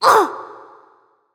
Grunt Hit; Paladins; Maeve

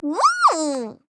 Falling; Rider; Paladins

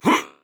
grunt jump; DarkLord; Paladins

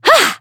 Grunt Jump; Tyra

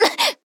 Grunt Hit; Evie Troublemaker

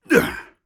Warrior Grunt Slow; Realm Royale; Hi-Tek Trooper

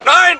pain

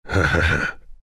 laugh, zh

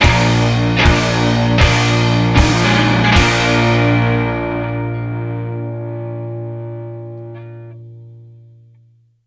MUS Death; Smite

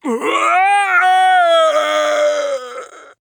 Falling; Paladins; DarkLord

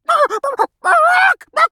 Chicken; Assassin; Shinobi; Realm Royale

